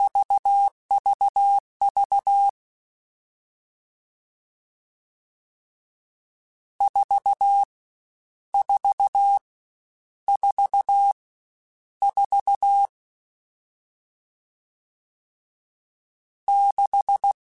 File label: CW Code Groups Mixed Short Runs Staccato; Morse Code; HamRadio